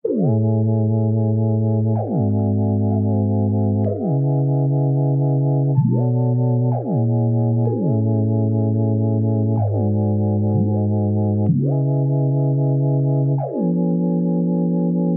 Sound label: synth chords loop sugar sine C#min